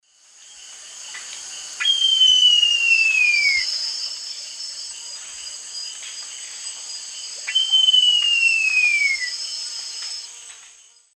Frederickena unduligera, alarm call; S. America; Eastern Lowlands

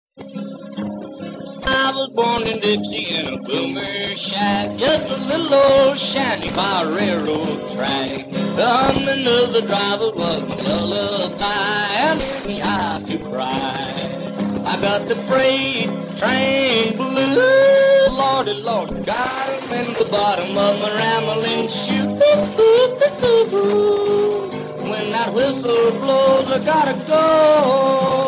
Old Country Music

